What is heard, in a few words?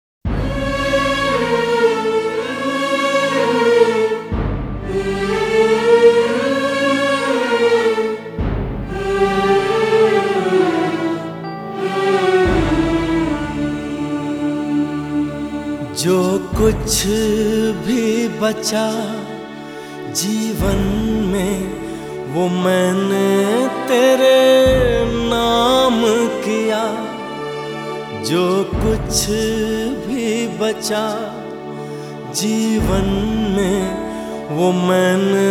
Sad Version